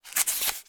diary small paper page turn; foley

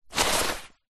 wetsuit top pick up; foley; zapsplat

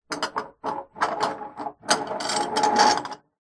foley glass jars in cupboard slide on shelf; zapsplat

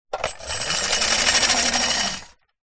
robot android metal old roll wood surface; science fiction

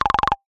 cartoon wobble flutter wood mallet style

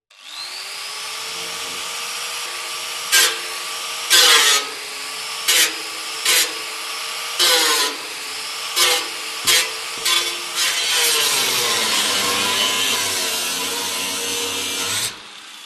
industrial angle grinder cut empty metal drum

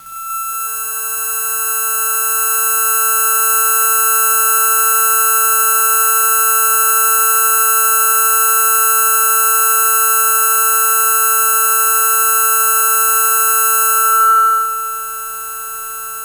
glitched tones High Frequency Feedback

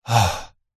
male middle aged exhale sigh moan; human; zapsplat

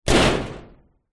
door metal thin close hard slam; household

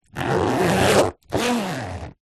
household makeup bag thick zipper

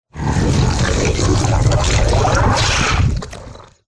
horror monster swamp growl slimy wet

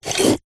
household cafetiere plunger lift coffee inside; zapsplat

